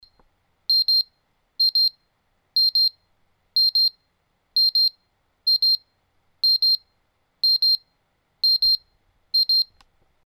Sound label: ALARM